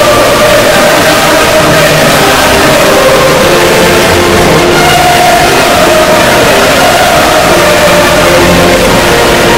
bass boost

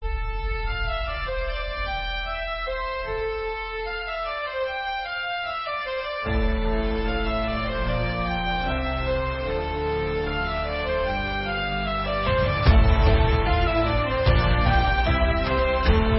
magic battle music

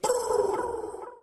bird brrr

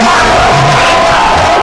jumpscare sound; fnabh a childs past

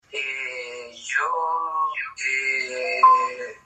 hee yoo hee

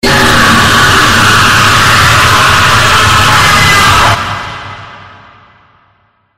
super scream

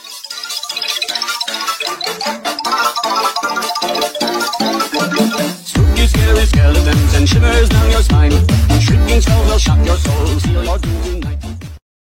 spooky sheesh

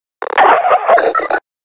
laugh; combine

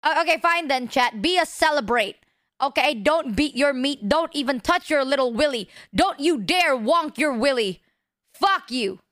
celebrate

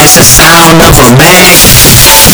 the sound of a bag distorted

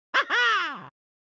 gigle; mario

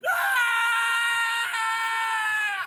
screams

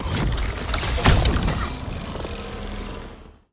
small attack; cryoclasm

